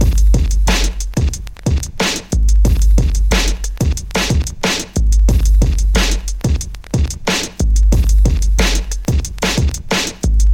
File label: 91 bpm vinyl hiphop drumloop, Boom bap drumloop sampled from old vinyl record